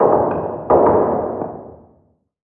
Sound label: Random Single Hammer; moul